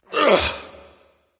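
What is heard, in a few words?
nonverbal grunt lift; smite; voicepack; ullr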